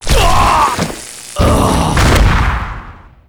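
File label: death; Booker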